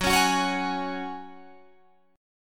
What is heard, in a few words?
F sharp Suspended 2nd, Guitar Drop D